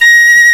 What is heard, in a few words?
FLUTE, WIND; Fairlight CMI IIX, WOODWIND